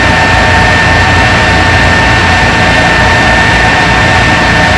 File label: amb turbine; cruisecontrol